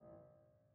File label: piano harmonics, A1